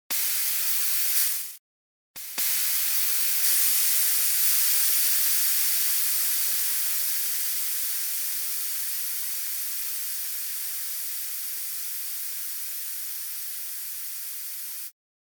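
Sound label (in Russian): ниппель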